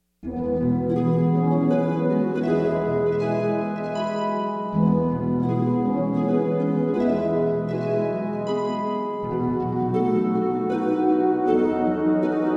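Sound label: Silent Night Horn; Christmas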